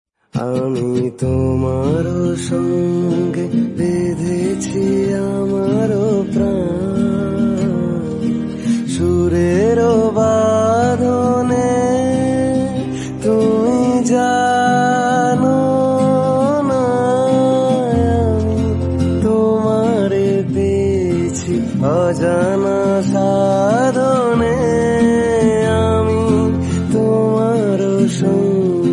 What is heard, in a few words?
Bengali Ringtone